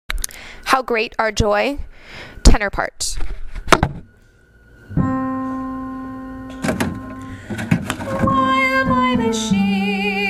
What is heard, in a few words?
how great our joy tenor